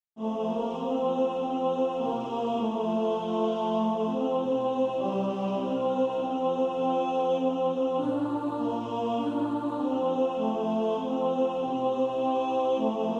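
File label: Trust and Obey ten, tenor, choir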